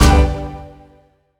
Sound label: scatter Hit; netent